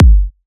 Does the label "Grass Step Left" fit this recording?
No